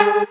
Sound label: Mainlead Melody